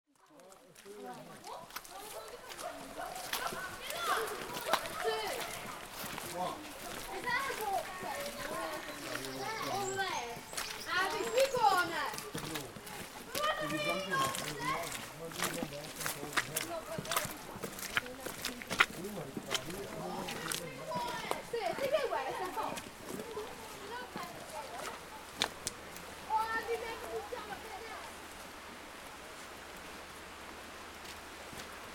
Bishopston and Pwll Du Soundscape

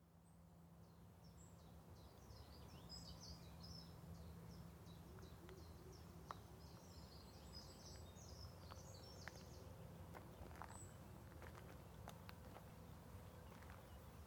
Marsh Walk Soundscape

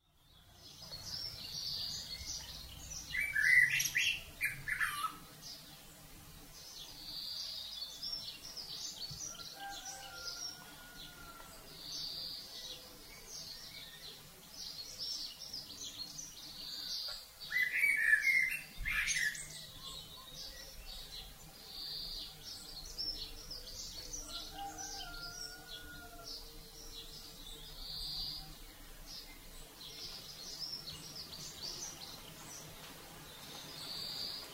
Morning Cockerel

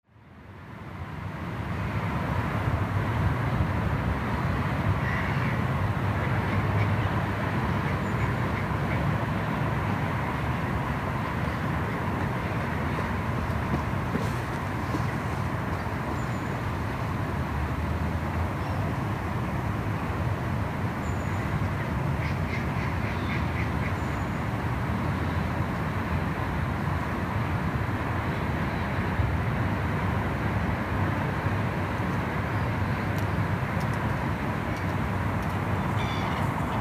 Urban Lakeside Soundscape

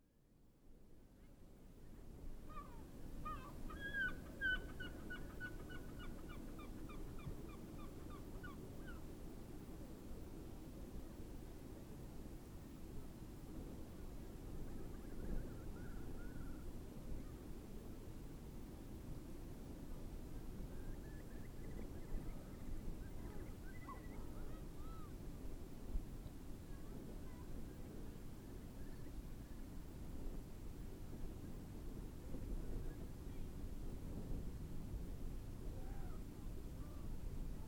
Llanelli Bay Soundscape

